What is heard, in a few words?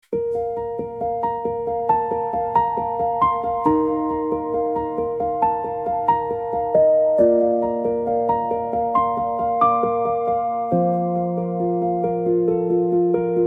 on hold music